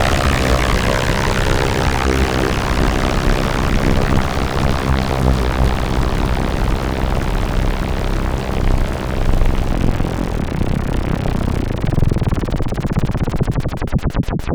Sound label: FX, Roland MKS-80